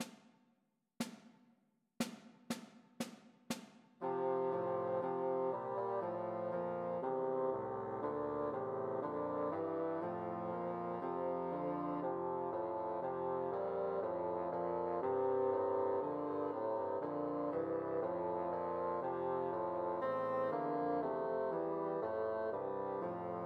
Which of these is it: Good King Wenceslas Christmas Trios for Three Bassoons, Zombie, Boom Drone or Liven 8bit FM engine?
Good King Wenceslas Christmas Trios for Three Bassoons